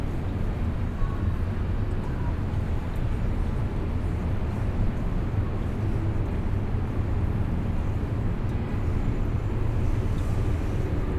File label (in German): sp von oben, Brasilien; Fieldrecordings